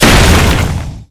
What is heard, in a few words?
Acheron obelisk hit; Arka